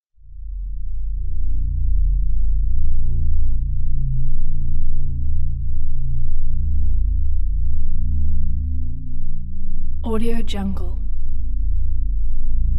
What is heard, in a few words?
extended drone